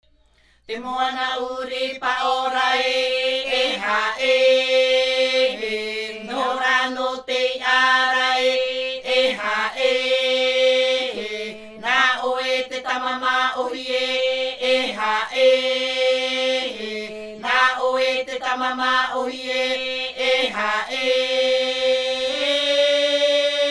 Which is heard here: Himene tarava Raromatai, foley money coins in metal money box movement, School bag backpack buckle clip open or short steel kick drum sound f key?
Himene tarava Raromatai